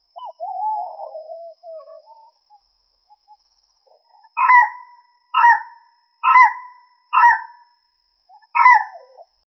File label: jungle insects distant bi; bird calls